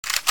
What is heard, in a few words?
ak47 bolt pull, weapons